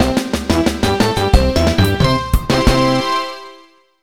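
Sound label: Level Clear! (Special Floor Fanfare); mario vs. donkey kong 2 march of the minis; mario vs. donkey kong 2 minimini daikoushin; nintendo ds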